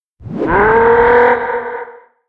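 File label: giant wolf spawn, monster; Senillinea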